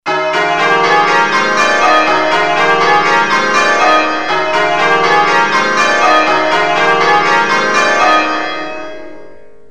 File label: Back Rounds, changeringing